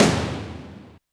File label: snaredrum, Yamaha RM 50